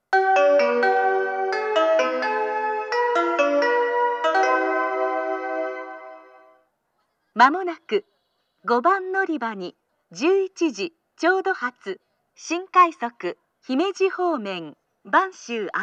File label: special rapid service, kyoto station; banshu-ako; jr-west